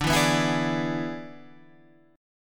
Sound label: E 7th D, guitar